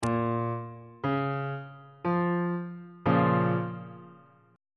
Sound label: b flat minor chord on bass clef